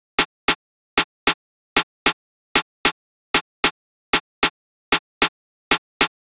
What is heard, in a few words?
Drum Loop 152 BPM Snare; Drum Loops; Loop Stems; Cymatics; Truck Drum Loop